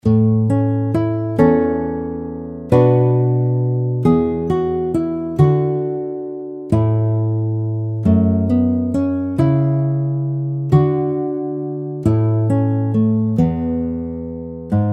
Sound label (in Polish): gitara; Morning Has Broken; Child in a Manger